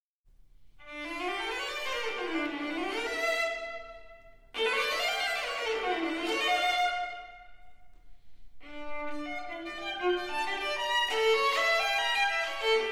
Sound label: Fantasia Cromatica; BWV 903